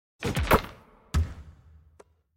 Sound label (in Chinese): 投中, nba; 战中